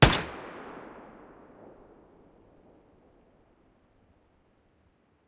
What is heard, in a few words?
tec 9, weapon